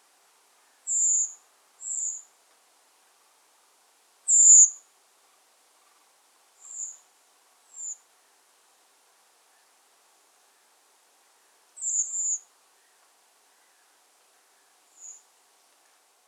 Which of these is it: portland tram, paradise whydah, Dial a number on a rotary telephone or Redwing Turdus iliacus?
Redwing Turdus iliacus